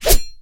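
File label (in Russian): быстрая внезапная атака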